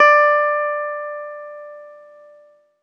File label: ZITHER; Best Service; Alpine Volksmusik